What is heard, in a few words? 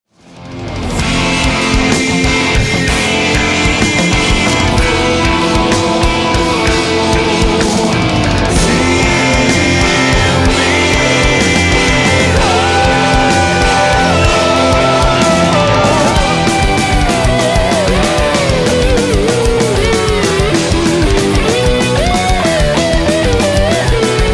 tribe guitar solo